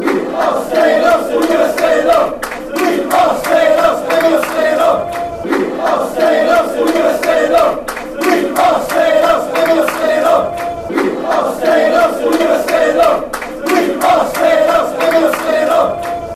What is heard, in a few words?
we are staying fanchants; nfc